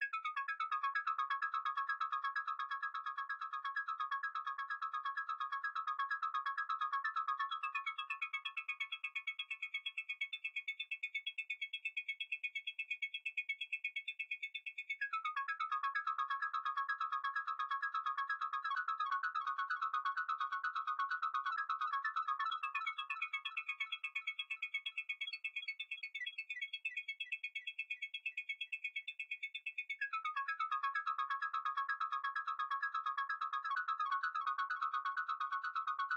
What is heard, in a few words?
ARPS; Rain World